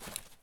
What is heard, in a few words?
multitool pickup; Escape from Tarkov